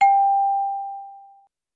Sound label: Timer Bell